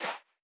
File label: Footstep Sand Walking